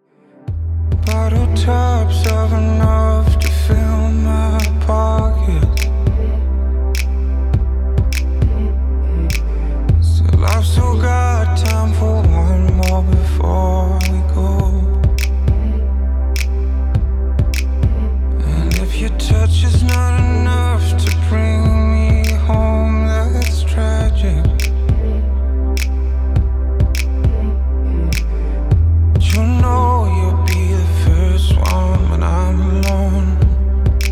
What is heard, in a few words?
slow grooves